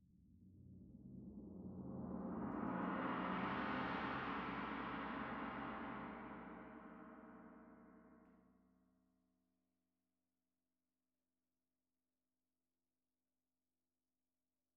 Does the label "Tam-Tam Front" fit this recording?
Yes